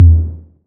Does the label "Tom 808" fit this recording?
Yes